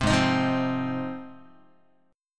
G sharp 6th G sharp down Guitar, chord; strummed; JGuitar

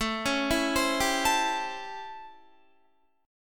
A 7th Sharp 9th A, Guitar; arpeggiated; JGuitar; 16,16,16,17,19,17

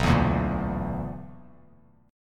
B-Minor Major 7th Double Flat 5th, Bass Standard; strummed; JGuitar

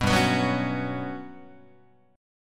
G Major 7th Flat 5th, Guitar; strummed; JGuitar

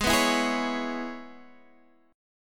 E 6th, G sharp

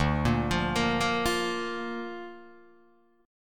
D Minor Sharp 5th D down Guitar DADGAD

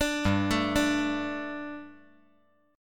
G Suspended 2nd, Guitar

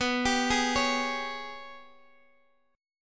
G sharp Minor Major 11th B

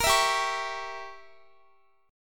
G Diminished 7th G down Guitar; strummed; 4E,4A,5D,5G,5C,6F; Gdim7

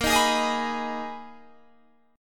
Ab Minor Bb, chord; Abm/Bb; strummed

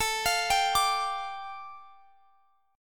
A 7th Suspended 4th Sharp 5th A, Guitar Standard; A7sus4#5; arpeggiated; x,x,19,22,20,22; down; capo=9

